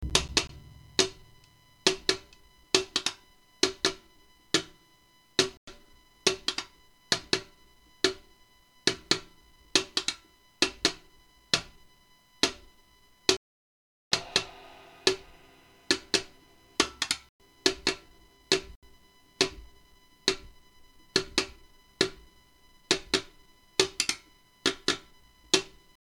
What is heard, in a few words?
Rimshot Noise